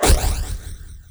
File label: glaives; weapons; dota; luna